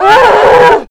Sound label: zombie pain; zombie plague